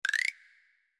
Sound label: Folder Opened; finn's sounds